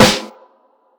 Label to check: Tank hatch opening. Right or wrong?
Wrong